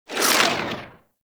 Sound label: Rifle Projectile Tail; Weapons; Warframe; Corpus; Ruban